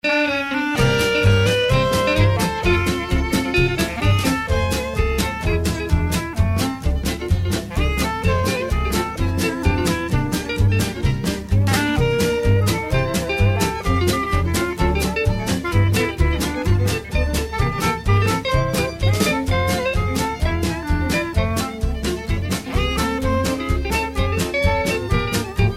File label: music sample